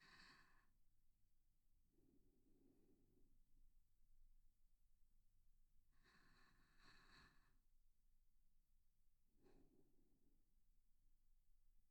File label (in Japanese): 寝息; SEなし